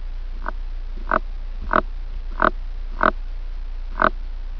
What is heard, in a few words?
Cyrtolobus, treehoppers; Cocroft